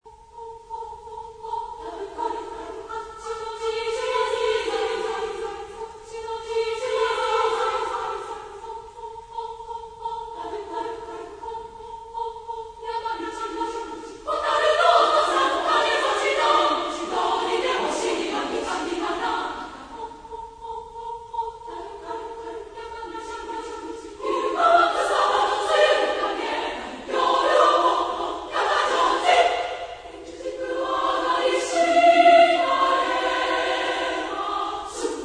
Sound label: female; OguraAkira; Hotaru koi; chorus